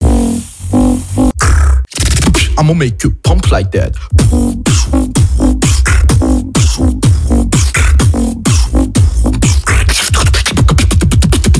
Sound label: Pump Like That Beatbox Version